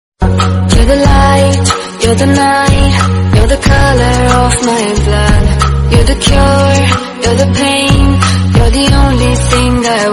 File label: Romantic English, ringtone